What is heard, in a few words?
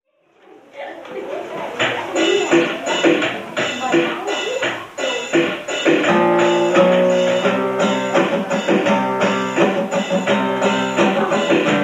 rap